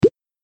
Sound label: Pop